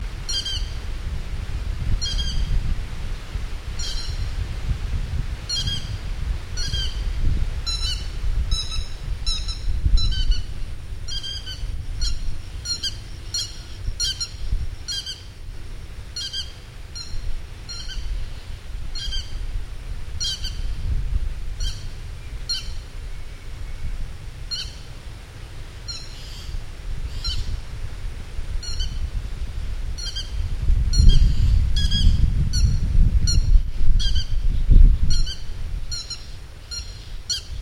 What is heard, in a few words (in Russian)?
seryy chibis golos